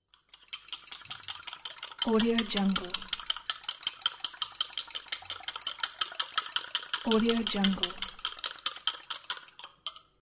eggs

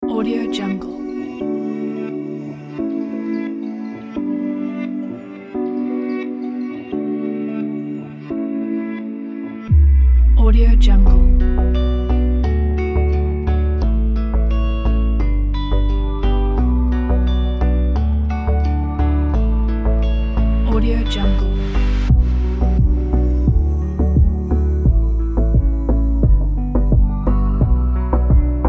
nostalgic modern reggaeton instrumental

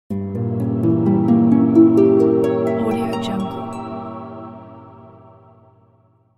harp and choir magic transition

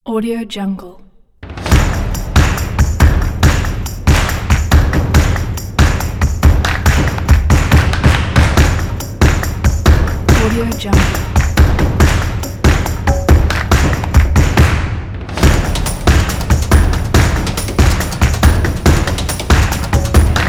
stomp

